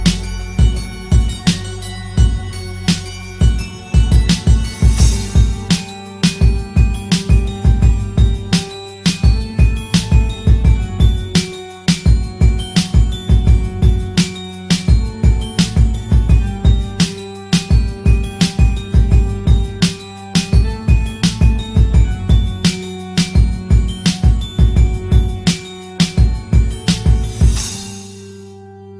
Hard Horror Rap Beat

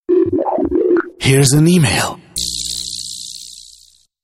best im email alert

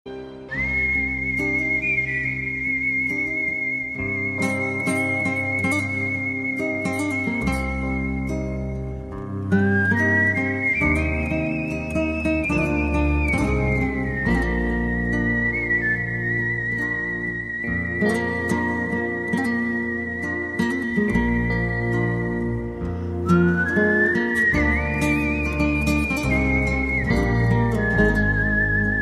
Iraqi music

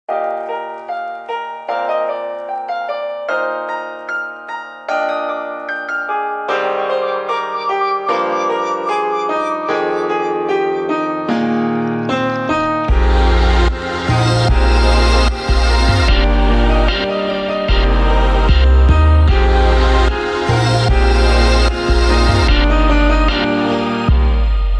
pure electronica, ringtones

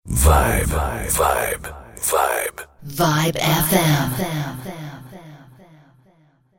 Jungle Vibe

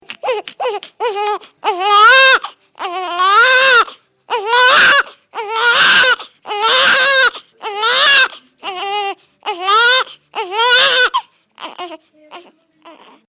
RNB

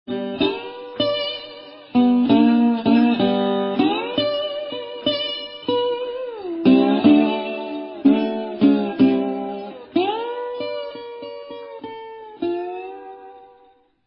blues slide guitar oldskool

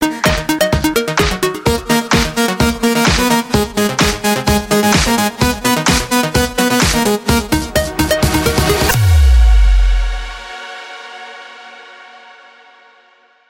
electro trance mix; ringtones

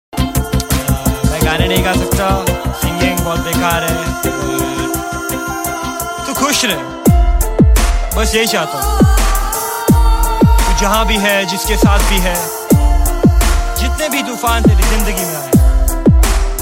emptiness rap

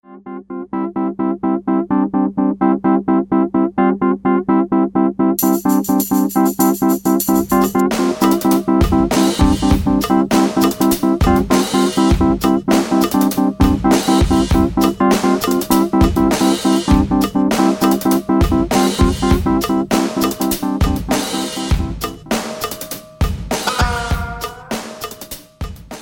piano drum, jazz; ringtones

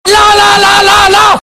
lalalalala

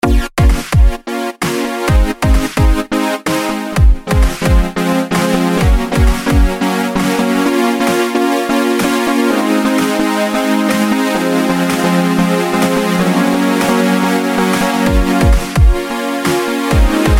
trance, ringtones

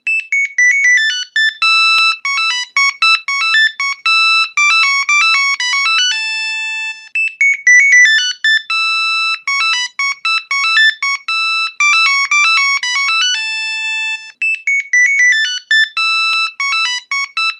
Siemens C45 Tune, ringtones

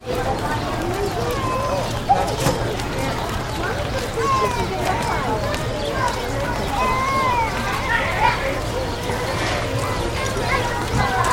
Crowd at Outdoor Mal

